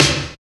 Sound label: ROCK PERC.